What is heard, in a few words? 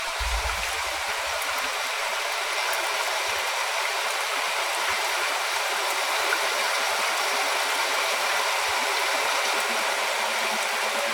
Rain And Train; Rain and Train 1